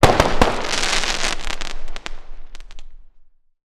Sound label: fireworks multiple explosions fizz; sound effects one